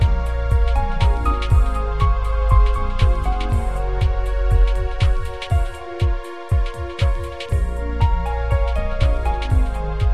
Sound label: Raining Melody